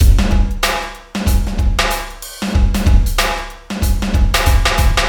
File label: DREAD LOOP